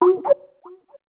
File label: incoming call; Netgear SPH101 Skype WiFi Phone; ringtone